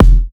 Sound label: largish kicc